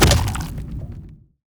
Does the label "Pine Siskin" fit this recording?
No